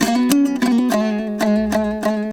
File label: HALAM MELODY; Best Service; World Colours